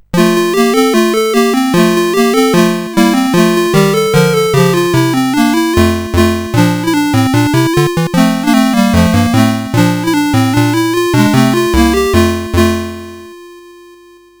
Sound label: We the People, CSG8580R5